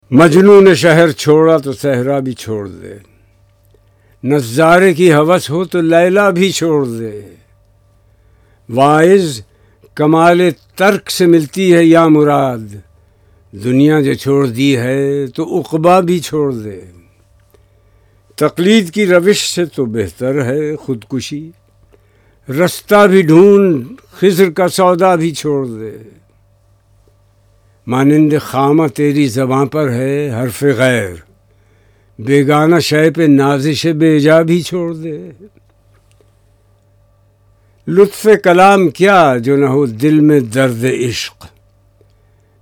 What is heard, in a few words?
Ghazal